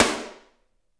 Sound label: focused snare sound g sharp key; Mono; snare drum samples